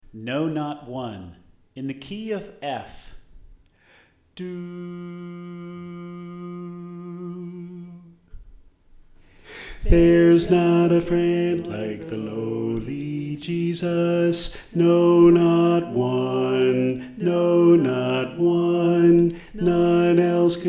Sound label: Bass